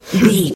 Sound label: voice female growl grunt